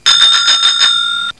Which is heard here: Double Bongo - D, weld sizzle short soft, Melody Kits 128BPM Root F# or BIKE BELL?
BIKE BELL